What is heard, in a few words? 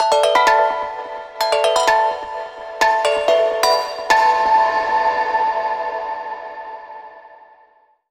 Inst Bell; legend of zelda the links awakening 2019 switch